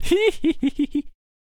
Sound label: Chariot Attack Vocals